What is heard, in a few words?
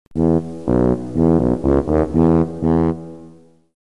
Tuba sound; ساز توبا